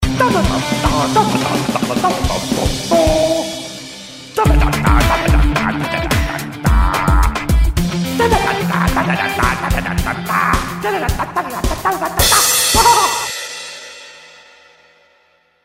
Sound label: Nokia, ringtone; mr bean